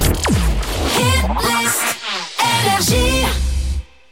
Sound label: Jingle; NRJ